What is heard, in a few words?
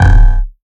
Buzz Basement; FREE SFX PACK VOL 2